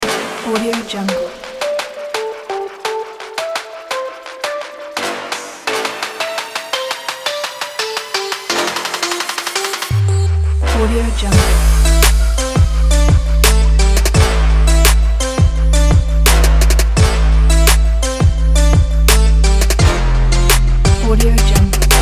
the trap energy

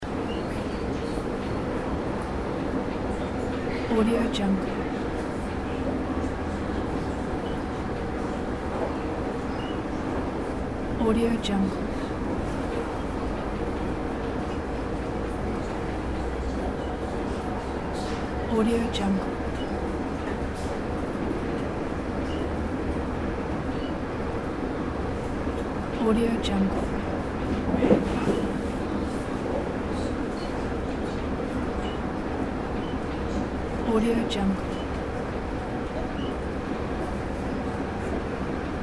interior of modern train with passengers

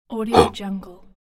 surprised

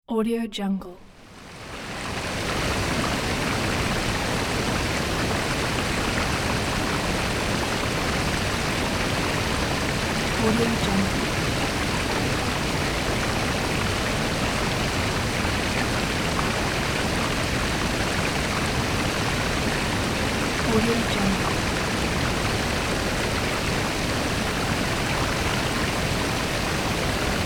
waterfall; worldwidesounds

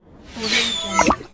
game booster

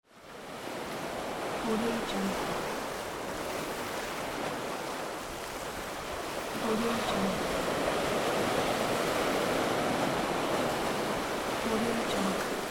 ocean ambience

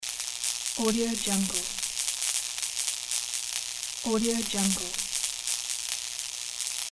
electricity sparks loop; audio-therapy

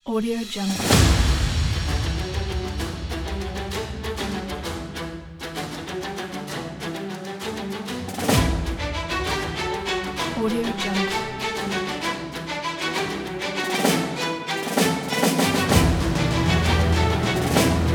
battleship adventure; blackbirdsound

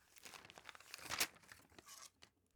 paper handling

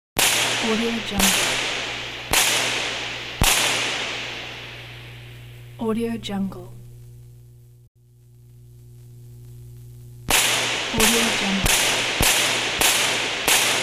arc discharges; almira23

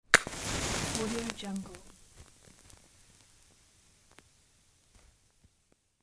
match lighting

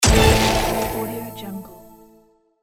cave discovered

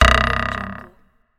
cartoon arrow

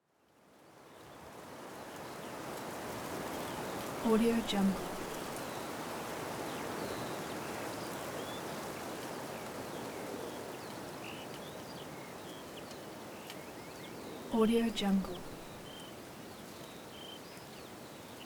steppe wind

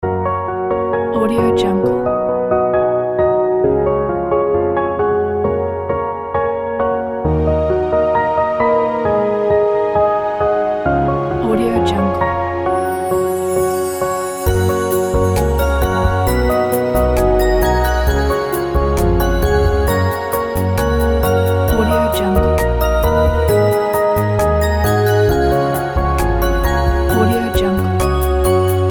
valentines day ident; 105studio

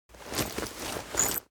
cloth leather metal handling short; foley; smartsoundfx

